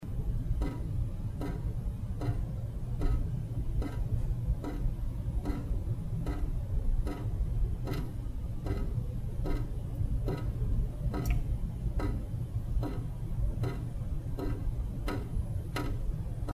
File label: Dripping Sound